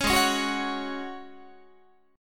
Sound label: Ab 7th Suspended 4th Ab down Guitar